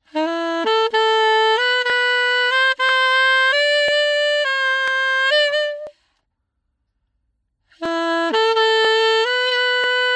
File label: raw sax